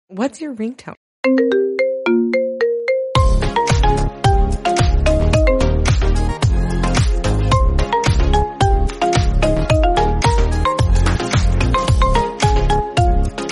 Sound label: piano, i phone mix